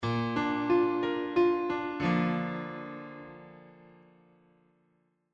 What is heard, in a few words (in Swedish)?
Tonartsgivare Bb